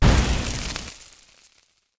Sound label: fire hurt, player hurt; Minecraft; Votive World